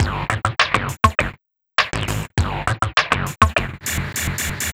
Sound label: Orbish Funk, Synchro Funk, Beats